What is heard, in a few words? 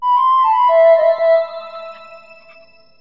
snowman taunt; dota; crystal maiden